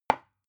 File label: glass put down; objekte